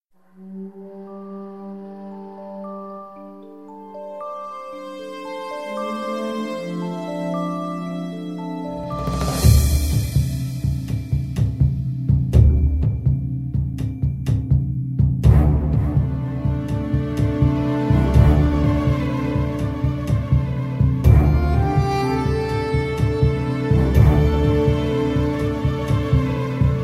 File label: Instrumental Music